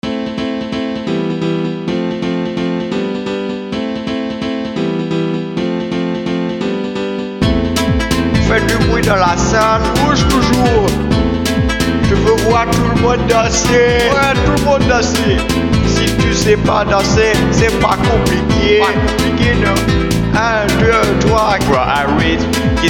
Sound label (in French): Musique antillaise ambiance sentimentale